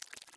slosh

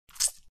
Suck; Lewd SFX

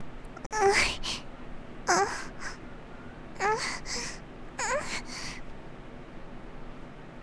Moaning; Apple Bloom

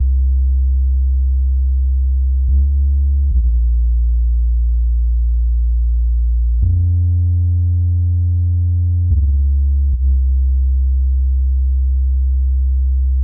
deep base, 145BPM

